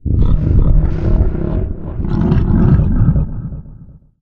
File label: boar, outdoor ambient